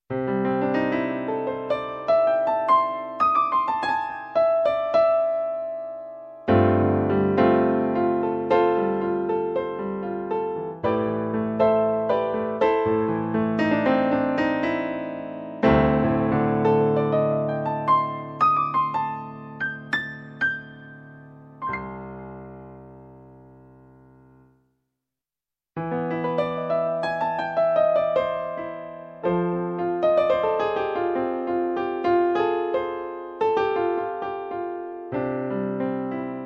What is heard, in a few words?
Piano